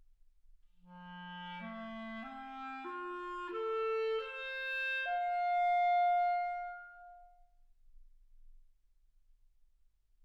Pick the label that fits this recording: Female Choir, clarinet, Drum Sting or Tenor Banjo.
clarinet